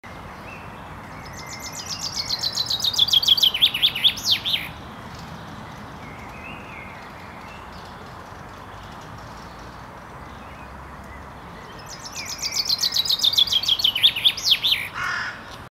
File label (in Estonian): Metsvindi laul